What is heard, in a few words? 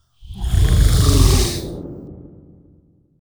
propeller whoosh